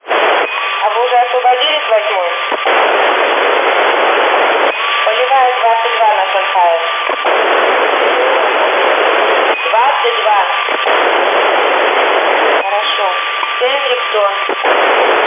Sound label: khz nfm